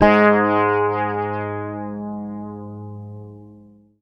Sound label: G#, Bass; Sampleheads; Will Lee Bass Library